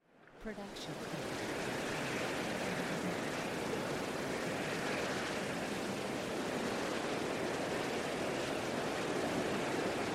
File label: wind constant; soundscrate